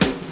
snare

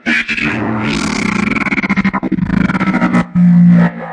transforming robot spindown

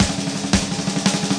171 the roll roll roll, DRUM LOOPS and BREAKS

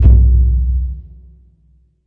big burundi, African Burundi Drums

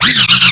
record scritch, Scratching and Turntablism; DRUM LOOPS and BREAKS

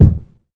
kick; Classic Hip Hop Mega Kit

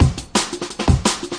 break liq, DnB Breaks, 171 bpm; 141 to 185 bpm; Ethex

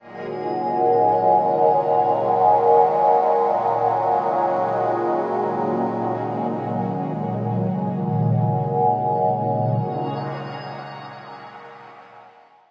light filled harmonics pad; Pads

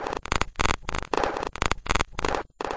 robot cricket malfunction; LOOPING AMBIENCE

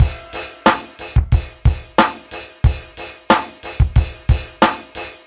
91 effective brut, drum loops and breaks; 091 to 100 bpm

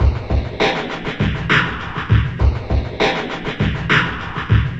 phasefilter break, drum loops and breaks, 100 bpm

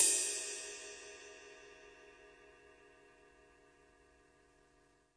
CYM RIDE, DRUMS SINGLE HITS

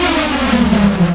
Electro FX; Ueb HE Electro FX

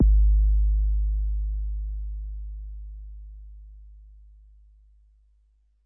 kick, drums single hits; iElectribe Kicks

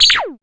electro perc; STYLE KITS (Hip Hop, Etc); Precise Electro Percussion

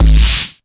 explosion snare; single hits

